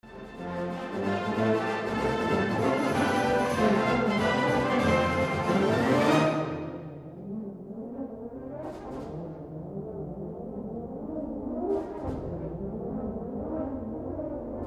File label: Harmony Music, Brass Band; May Yardley Hall